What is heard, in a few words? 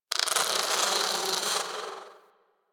Garden Fly; Warframe; Ambience; Clan Dojo; Dojo Garden Animals